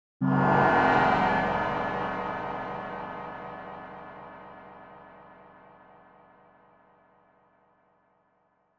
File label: Gong C